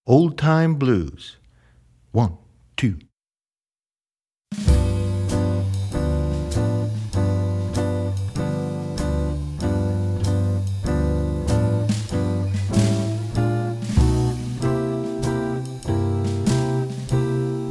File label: Old Time Blues